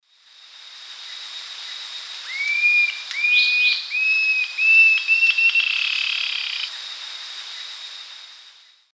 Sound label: Galbula albirostris, song; S. America; Eastern Lowlands